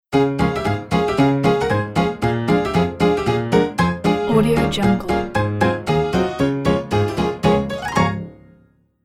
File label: retro piano ident